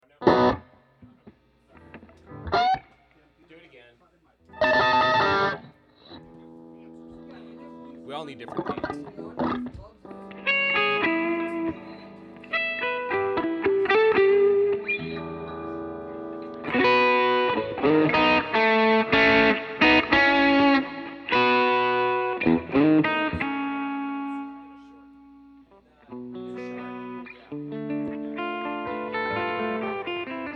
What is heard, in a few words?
Guitars Mix